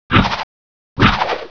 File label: tube shoot